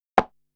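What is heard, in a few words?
Drum Analog Kit Conga High Mute, Yamaha RM1X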